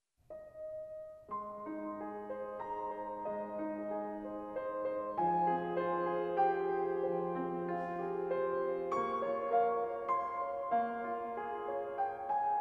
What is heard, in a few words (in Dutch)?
Kerst-medley solo vleugel